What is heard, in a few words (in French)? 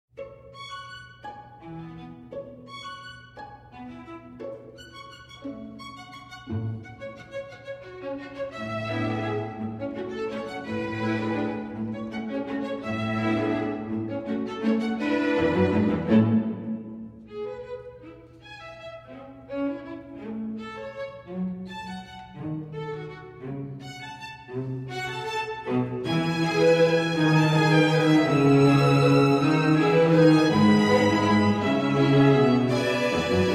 scherzo pour deux violons alto et violoncelle